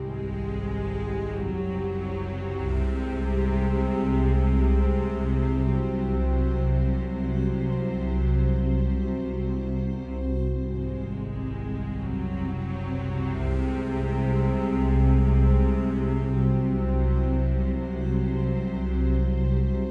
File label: Suspense loop